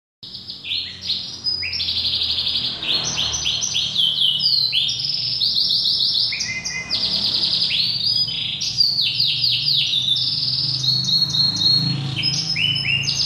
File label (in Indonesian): audio kenari; gladiator original